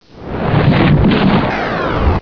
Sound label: Ship landing; Bedlam 2 1.1.1; Bedlam Sounds